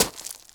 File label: sand; casualtiesunknown